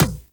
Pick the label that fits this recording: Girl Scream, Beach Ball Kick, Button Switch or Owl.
Beach Ball Kick